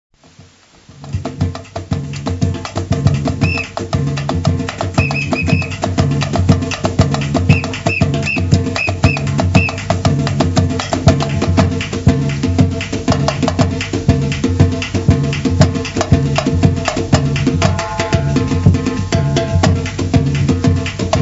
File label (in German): samba schlussbreak